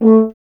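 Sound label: brass French horn; Miroslav Vitous; Solo Instruments VOL-2; Solo lnst. II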